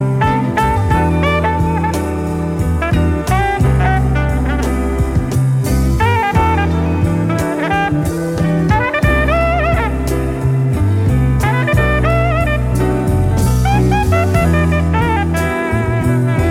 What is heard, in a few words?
slick sax; Jazz